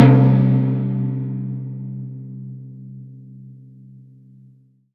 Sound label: C2, synth; 90 s Sample CDs; NiceBeats; SynthLine VOL-27